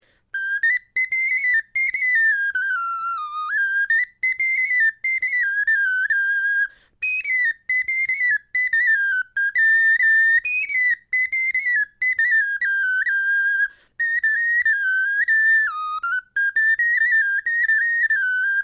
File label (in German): melodie, knochenfloeten